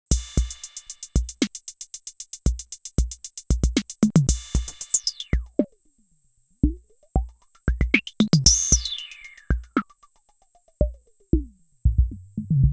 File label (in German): Drums mit Effekten Wah